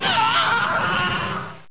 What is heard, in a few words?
munch; robots